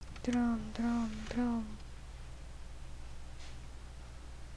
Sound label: drum